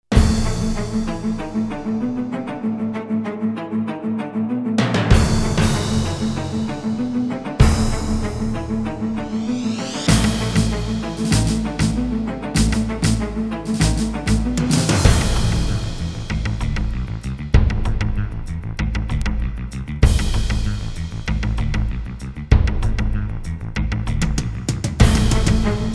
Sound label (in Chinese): 电视包装音乐